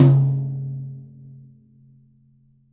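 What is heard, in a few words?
Jazz kit tom, Drums Acoustic; AKAI; JAZZKIT 2; 90s sample CD; USB Soundscan vol.10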